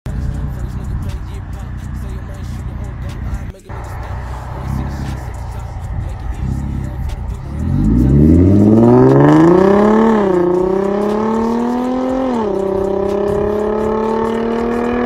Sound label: Genesis coupe